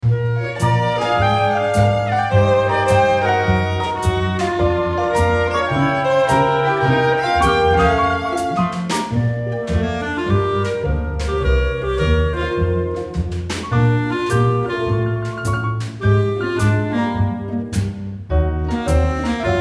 Menutheme Orchestral; Toystory 3

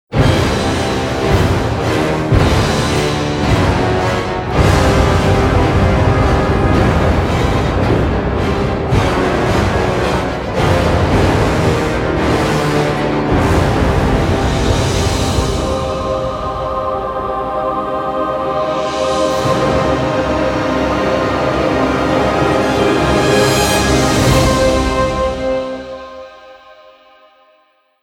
Cutscene Boss Extro Orchetral Horror; Lords of the Fallen

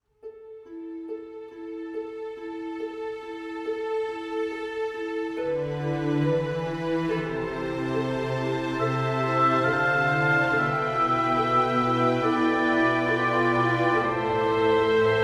Ingame Orchestral; Anno 2205

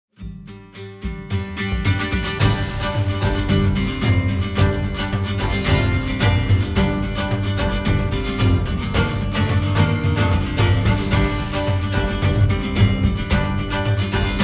Battle Folk LIVE; Valhalla Hills

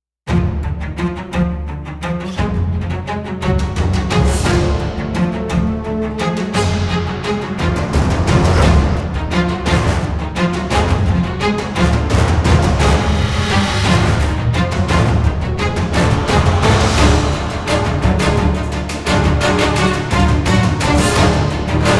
Action Orchestral

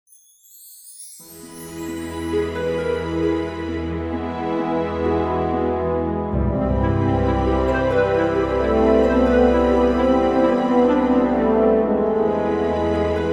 Maintheme Orchestral

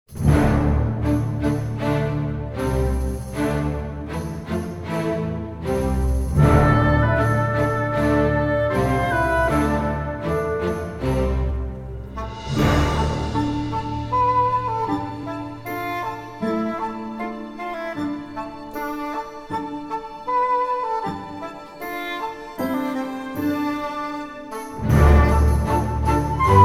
City Background Music

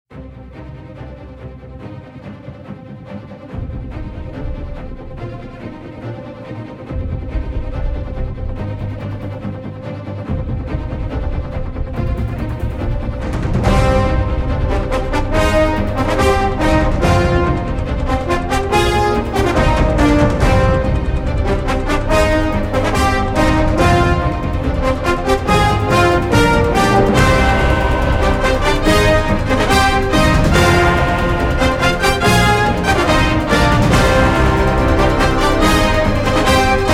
TV THEME SPORTS

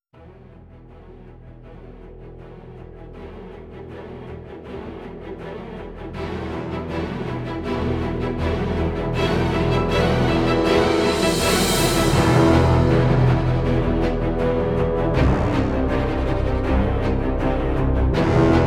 Action Strings; NI Action Strings

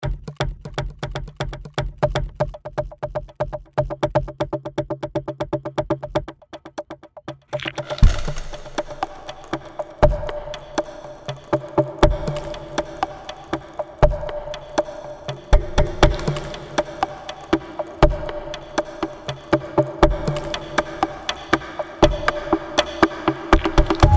Forest Walk

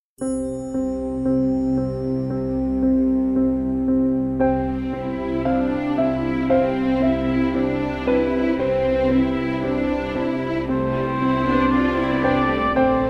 Orchestral Emotive Strings

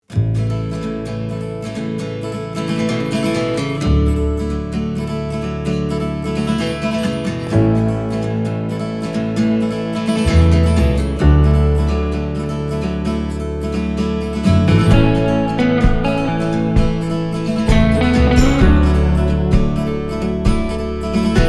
Score Folk

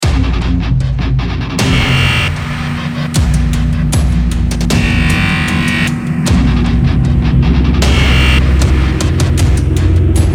Maintheme Evil Industrial

